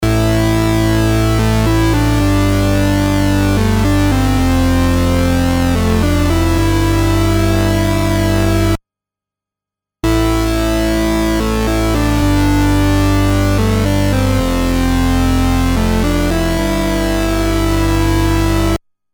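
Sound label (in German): Pulswellen Modulation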